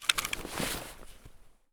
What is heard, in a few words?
backpack open; Escape from Tarkov